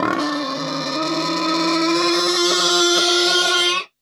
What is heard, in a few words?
SFX, 160 BPM; Ueberschall; Drum & Bass Flash Trackz